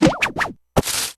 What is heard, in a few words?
veggie throw; old rootie